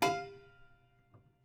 Prepared Piano

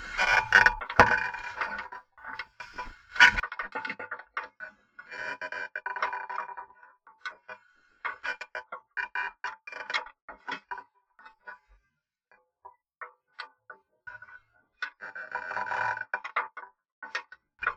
Sailing

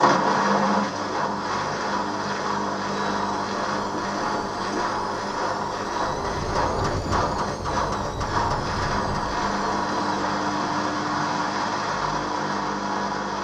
Steady State, Washing Machine Wash Spin Cycle

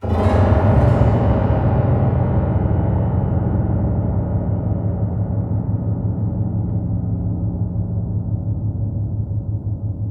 String Brush, piano; Piano Miscellaneous

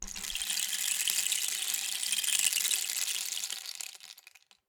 Rain Stick, Percussion Samples

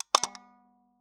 Taxi Meter

